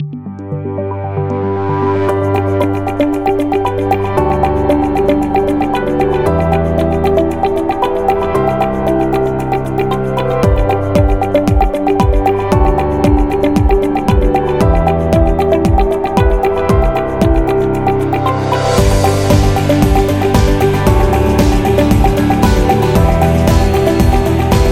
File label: The Motivational Corporate; corporate music vol 11